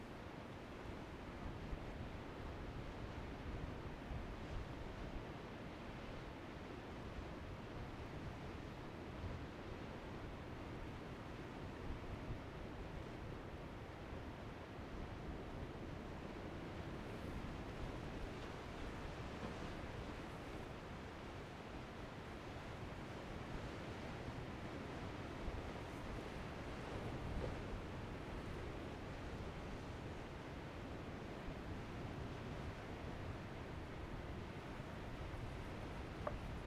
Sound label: Coast Ambience Clifftop Distant Waves Cornwall England